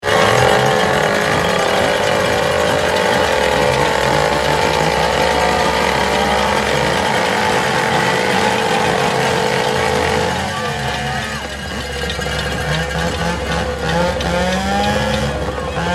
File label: Ambiance